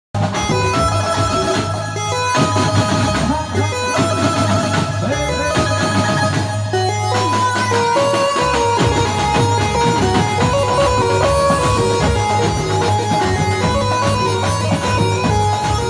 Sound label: Nagin Ringtone Remix